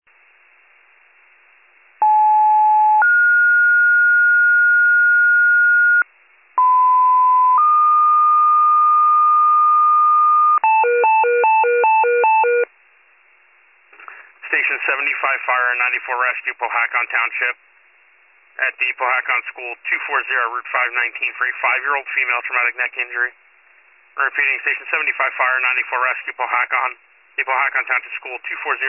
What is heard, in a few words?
fire, pager alert